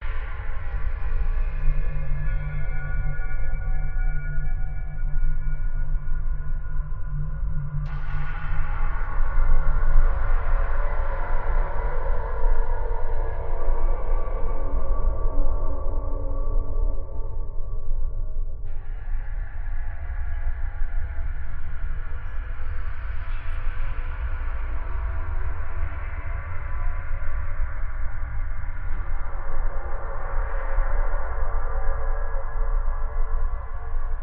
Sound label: AMBIENCE SOUNDSCAPE LOOP; PMSFX